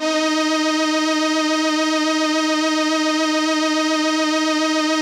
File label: SYN, ENSEMBLE